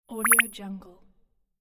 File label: electronic bubbles